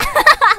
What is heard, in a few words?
combo break; [DT] Rafis but Zylice